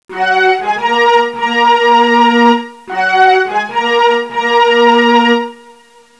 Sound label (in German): AKKORD